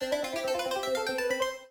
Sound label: Ui Fill Xp Bar